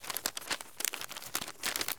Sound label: Paper Airplane Fold; SFX Kit; Foley - Misc